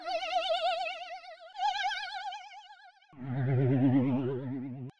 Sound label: Video Game 16 Bit Ghost Cry